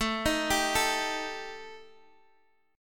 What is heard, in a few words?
D sharp Major Flat 5th